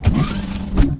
door; duke

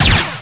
monster minigun; alienshooter

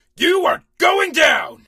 alert; st allies; darkwing

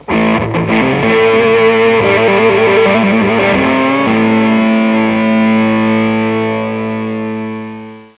guitar

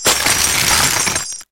FREEZE; duke

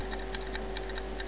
clock; blackops

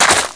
dirt, player; blackops

